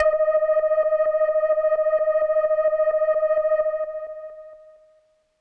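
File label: Leslie Kawaii D#5, organ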